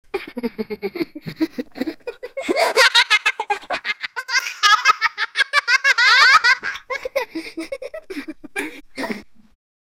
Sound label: realistic sound, laughter